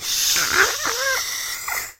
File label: inflate